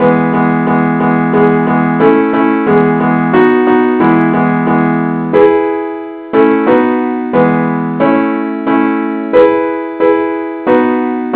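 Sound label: simple accompaniment, key e; christe Sanctorum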